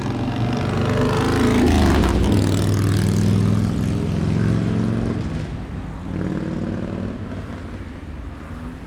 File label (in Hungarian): motor dübörögve; 7 haztol